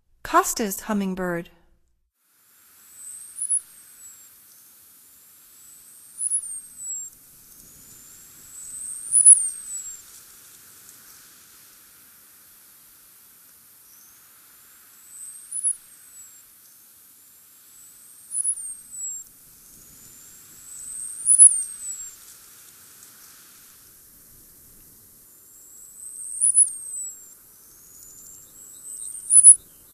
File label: Costas Hummingbird; North America; Stokes